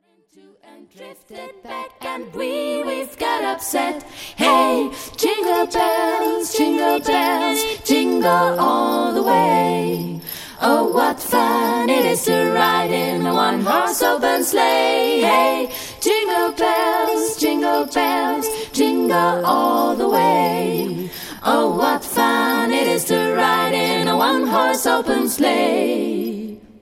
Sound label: jingle bells